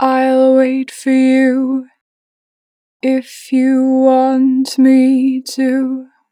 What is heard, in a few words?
Natural Vibrato, Vocals, I'll wait, 4 bar vib; Harmor Vocals